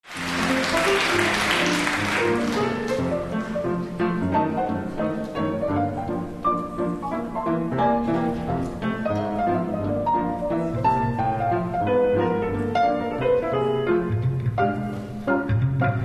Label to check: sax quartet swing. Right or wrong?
Right